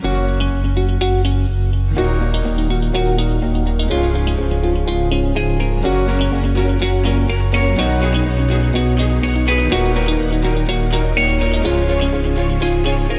Positive Change No Drums; 15sec

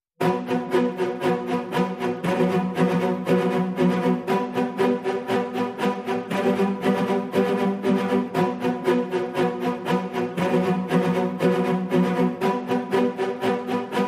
Strings Mix

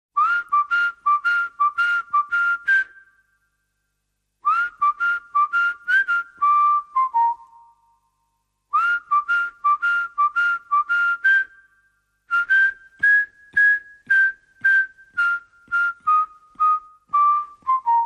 Whistle Only

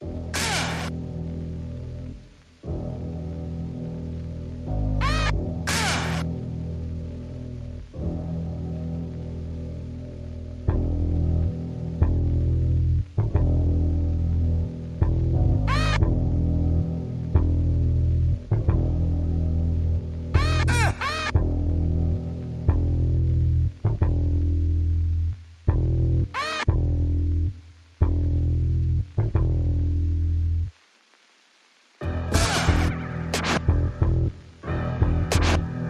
No Drums Reduced Arrangement

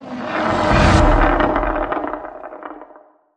Dreidel Spin Fast Whoosh

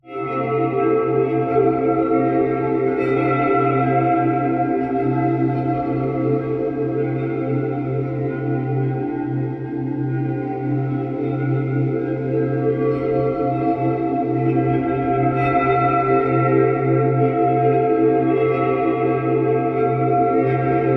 Twilight Dream Drone

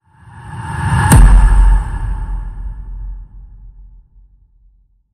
Serve Stomp Hit

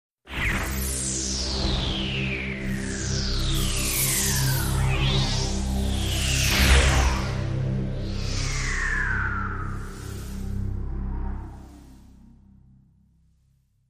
Big Multi Sweep

